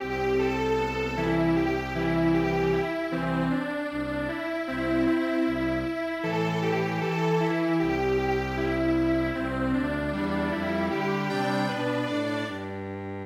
Strings Quartet, midi traditional

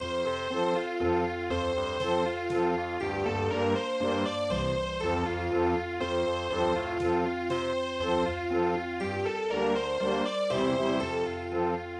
vienna waltz; See Saw Marjory Daw

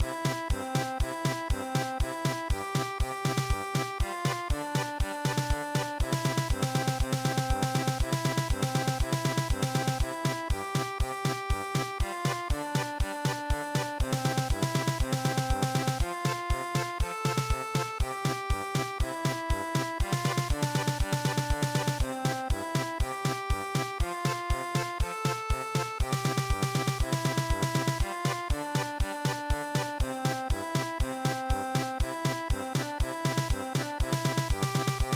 honeybee march German Polka

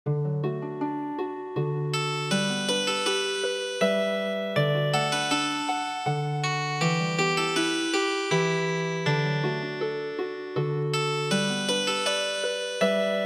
Healing Harp, midi; Schlaf ein mein Blond-Engelein

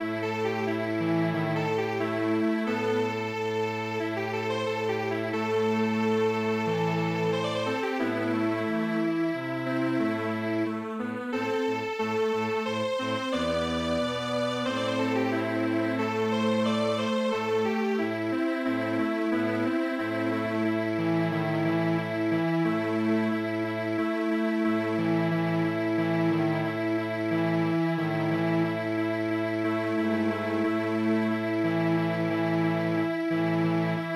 arirang Strings Quartet; traditional

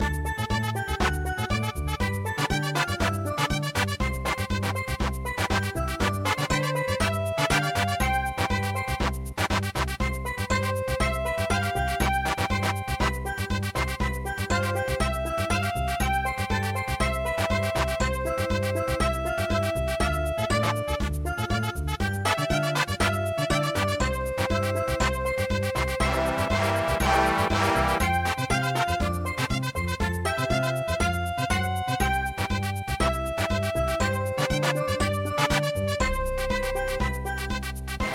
saints marching mambo

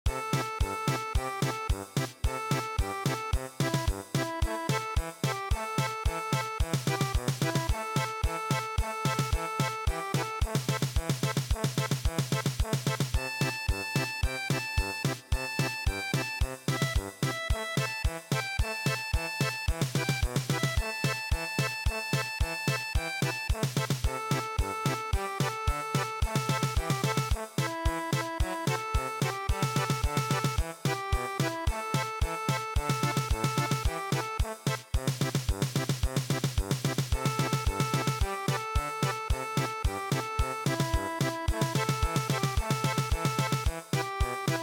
German Polka

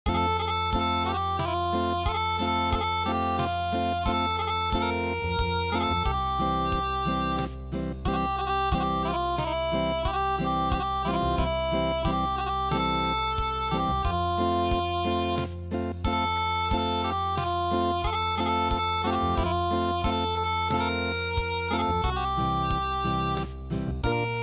Unplugged

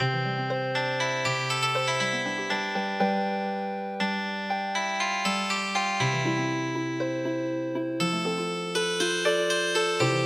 Healing Harp

